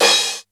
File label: SHORT CC, D110 DRUMS; Crypton - DrumBase5000